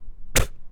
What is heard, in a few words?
Spit